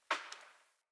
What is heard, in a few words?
Day Branch Crack Small